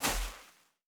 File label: Personnel Footsteps Sand; DCS